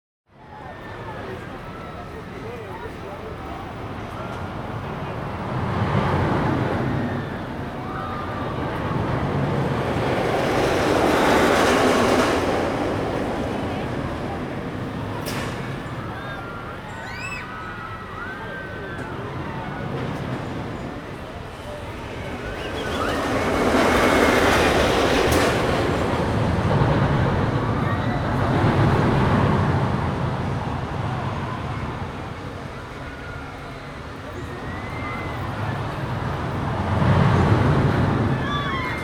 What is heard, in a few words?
roller coaster